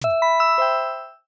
sfx revelation chime; video editing

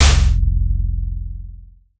braam deep

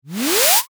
short riser

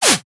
whip whoosh

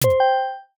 oneshot confirm fold

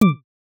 pen cap click; foley

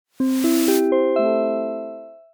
memory harp mist; video editing

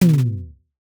wood panel slam

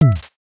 stapler single; foley

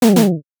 nature dog bark small

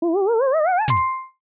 spin and pop

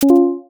coin pop

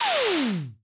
fast silk whoosh

